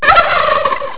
gobble

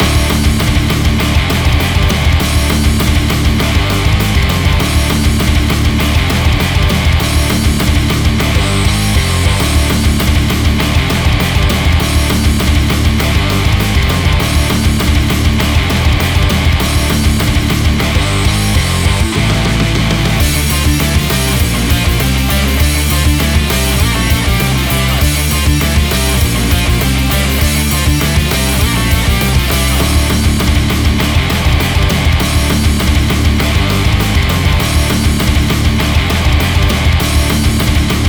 neo rock; svencoop; uboa rampage

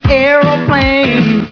aeroplane; svencoop

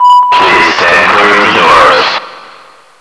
train doors; svencoop

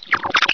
hatchet sight; gearbox

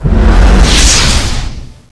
seal start; wizardwars; spells

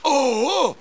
pain; svencoop; paranoia; terror

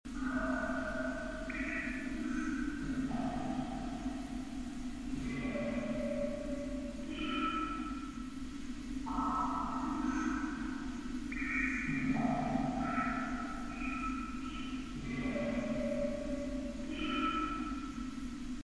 ambient; ns enceladus

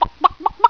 chicken

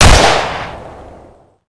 m16 single, weapons; svencoop

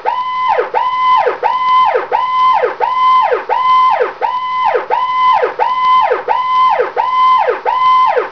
loud siren

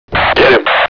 ambush; svencoop; sc operatives

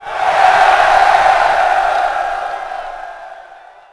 cheer; tfc; ultisol

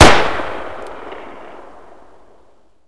g3 fire; firearms

